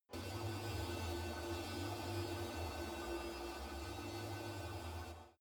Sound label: space alien fabric